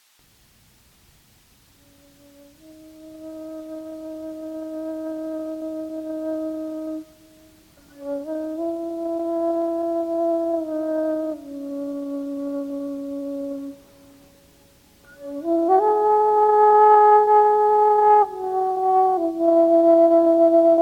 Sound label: Flute Spontaneous